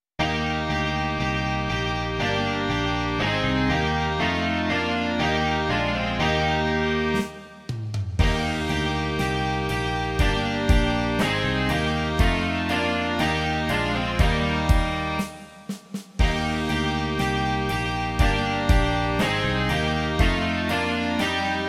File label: rock